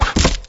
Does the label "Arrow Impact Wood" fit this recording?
Yes